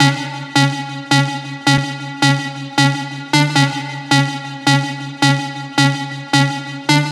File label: Synth Loop C# 135, Techno; Ghosthack; AC2024 - Day 1 - Techno Sounds 2025